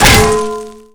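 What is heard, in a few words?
pan impact robot; melee impacts; Garry's Mod; tf2; mvm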